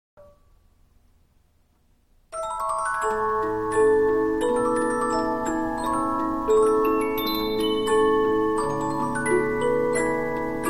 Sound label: Polyphon, 15inch disc musicbox